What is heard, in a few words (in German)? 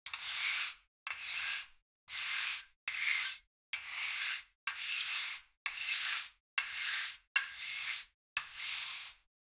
household